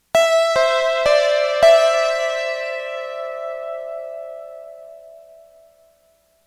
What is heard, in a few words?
Glass Harp